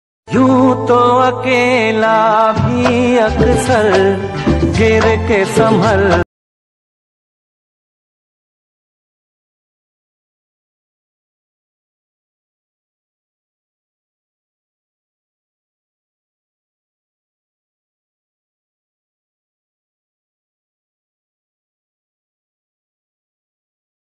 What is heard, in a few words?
old hindi song instrumental ringtone